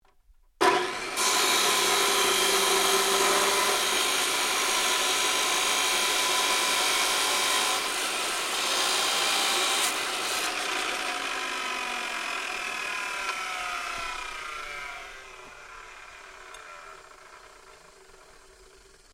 Dropsaw Grinder Blade Grinding, Dropsaw metal cutting; Saws; Machines